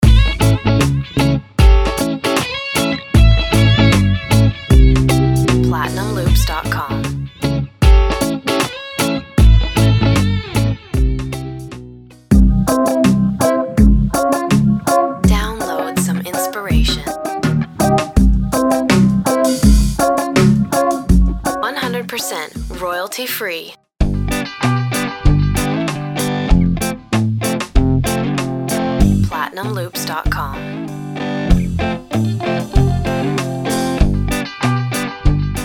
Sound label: reggae guitar loops voice duck